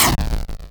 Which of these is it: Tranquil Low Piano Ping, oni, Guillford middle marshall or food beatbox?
oni